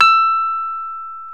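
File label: piano Rhodes Mk.V; PNO; Roland LCDP10 Keys of the 60s and 70s 2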